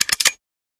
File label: Gun Lock; FREE SFX PACK VOL 1